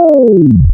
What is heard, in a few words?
Steel Drum, wavetables; Animoog